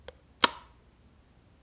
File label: flicker off; Timelock VR